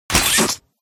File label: hand break; Extendo Hands